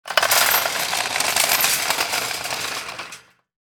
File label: Shopping cart rolling short